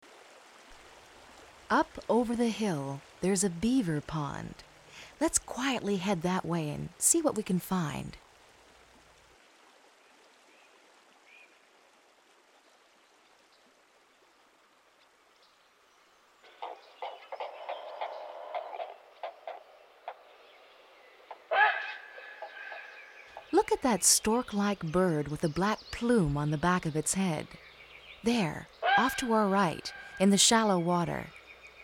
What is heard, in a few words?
Great Blue Heron, Nature Trails